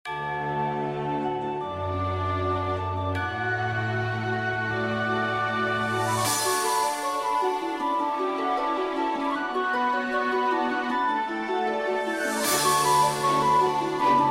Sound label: Victory!